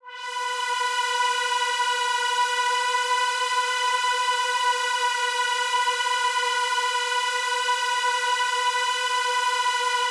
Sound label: Big Pad B3; KG MegaSaur